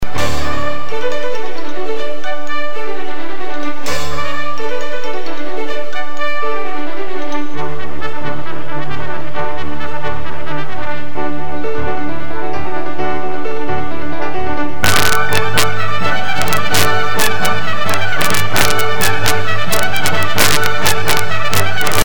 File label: hoe down, brass